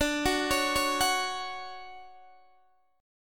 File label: D Major 7th, D, down, Guitar DADGAD